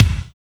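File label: BOOM kick; Drums In Your FACE!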